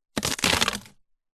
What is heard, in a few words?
wood split crack break splinter tear